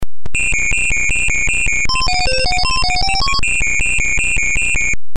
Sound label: alarm music